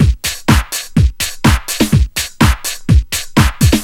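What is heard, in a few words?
ORION LOOP, Techno Trance; E-MU Formula 4000 Series Vol. 2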